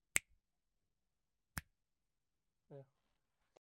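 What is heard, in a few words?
finger snap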